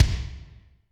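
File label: Kick Coliseum, Alesis D4; Kik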